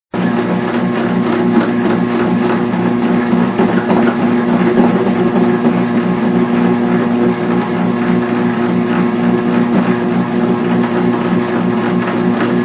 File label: machine noise, cooking